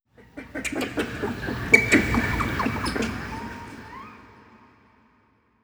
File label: creature cackle; environment